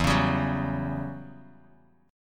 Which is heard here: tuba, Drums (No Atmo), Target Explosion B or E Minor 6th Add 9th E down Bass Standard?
E Minor 6th Add 9th E down Bass Standard